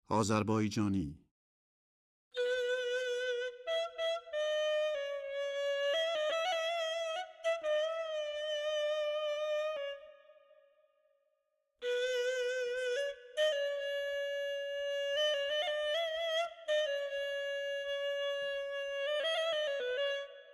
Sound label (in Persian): Azarbayjani Shur Radif-e Ney, Dastgah Shur